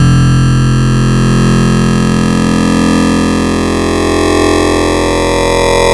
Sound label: Bit Ring; Ableton Live 12 Lite; Vector; Sprites